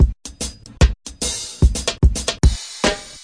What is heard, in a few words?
074 bpm reggae kick hat snare break